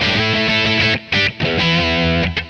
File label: E4 95 chords